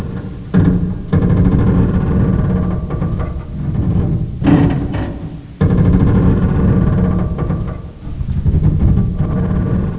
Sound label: creaky metal; firedamp